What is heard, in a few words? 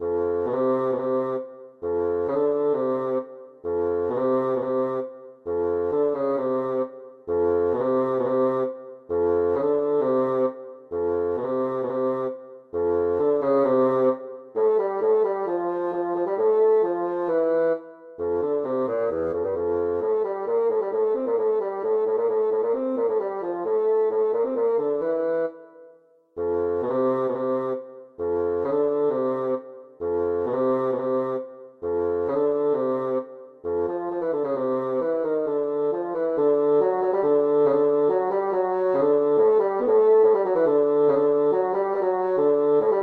trio